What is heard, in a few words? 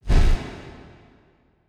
Foley; WRY; M8; percussion and foley